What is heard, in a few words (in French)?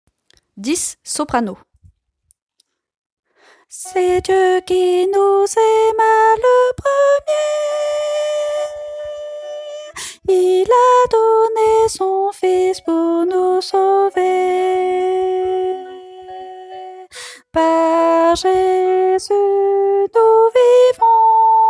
soprano